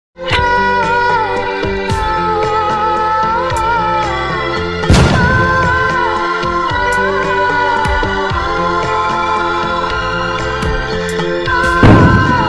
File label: so sad ringtone; ajay devgan; ishq movie